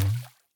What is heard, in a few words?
reel Stop Boing; strollingstaxx